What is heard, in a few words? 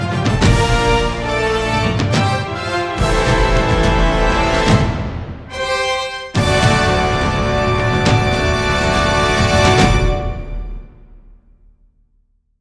win theme; Brawlhalla; 5th Anniversary